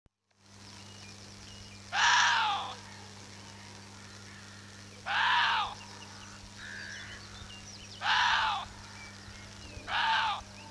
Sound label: Water deer